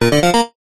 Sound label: multimedia game tone retro positive complete bright